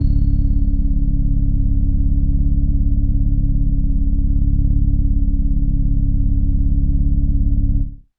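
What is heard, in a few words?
Indie Organ Kawaii C#1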